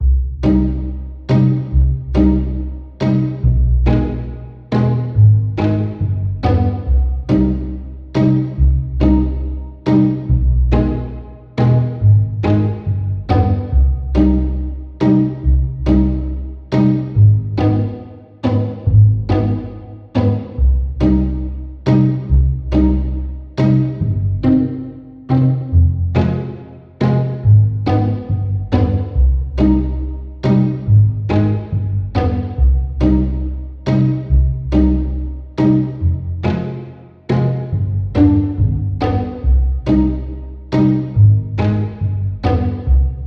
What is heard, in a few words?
Strings Only